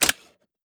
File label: button chair; through abandoned 3 the refuge 2019 gamerip pc